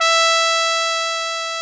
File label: trumpet E4; Greytsounds VOL-1